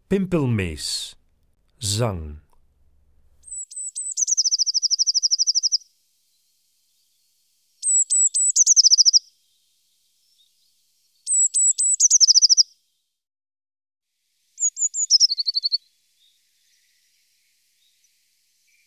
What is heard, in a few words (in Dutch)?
Pimpelmees, Zangvogels; IVN